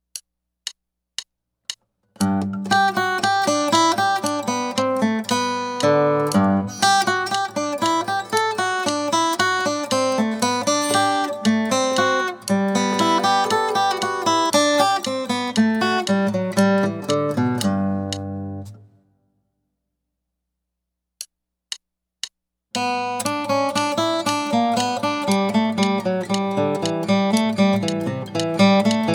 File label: Picking Studies In G